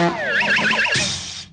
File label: hit spin and fall